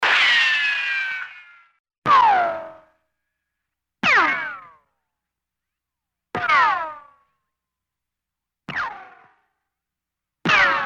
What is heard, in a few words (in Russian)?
Rikoshet svist puli